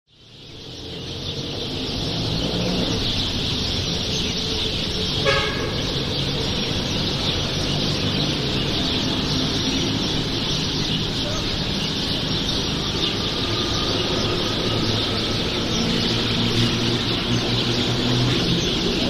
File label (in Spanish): plazas; cornelio saavedra; Rosario